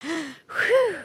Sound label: surprised; emotes